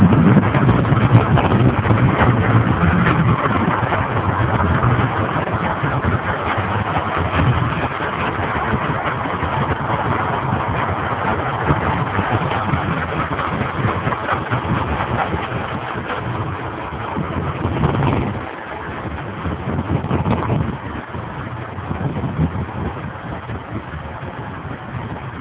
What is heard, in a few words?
SF Bay Ship